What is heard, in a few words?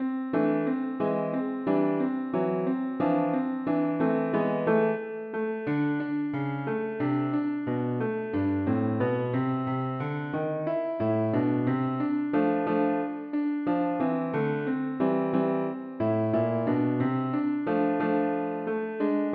Chag Purim Jazzy in Am